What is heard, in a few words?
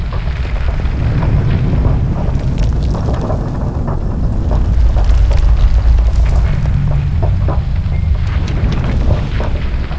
unstable ground; dm borehole